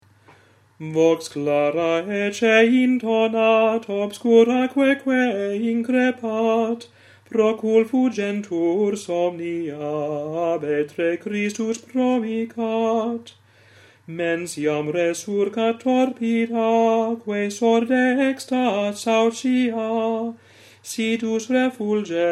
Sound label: Vox clara